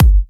Kicks; Vengeance Samples

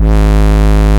Root G#, Bass; Vengeance Samples

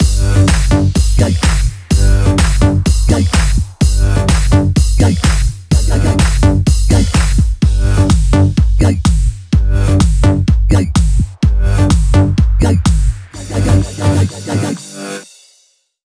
Mix, 126BPM; Cubic Kit; Vengeance Samples

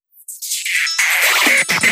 Fill 128 BPM; Vengeance Samples; VEH1 Loops

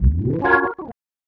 organ F#, vocal house; PS-28 Vocal House Samples; organs

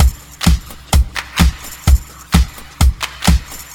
Housebeats, 128BPM; Vengeance Samples; VEH2 Loops

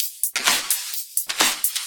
Electro Loop 128BPM; Vengeance Samples

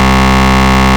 Ultimate Bass Root C; Vengeance Samples

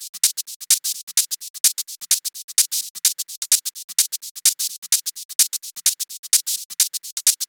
128BPM Drums; Vengeance Samples; Control Kit

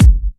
Soft Kicks; Vengeance Samples

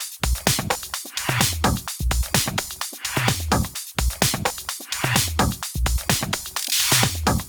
House loops 128BPM; Vengeance Samples

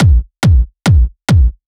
Kick 140BPM, Drums & Perc; Vengeance Samples; Incast Kit; E minor

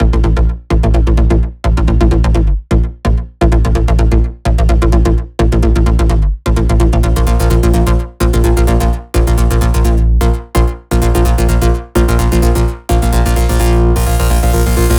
Melo 128BPM; Mini Kits

